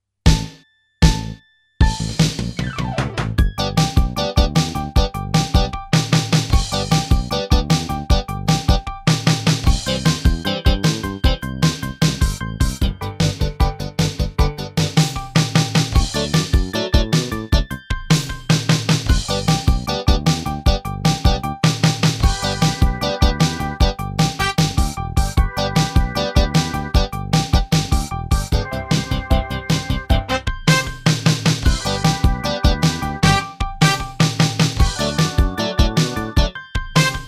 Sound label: Rock and Roll